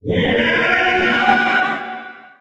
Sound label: poltergeist attack; STALZONE; customnpcs; stalker